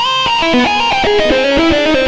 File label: xtra lead; outside; Sonic Foundry (Sony Creative Software); Ugly Remnants VOL-2; Leads